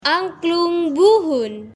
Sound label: angklung buhun